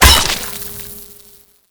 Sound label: bolt skewer, crossbow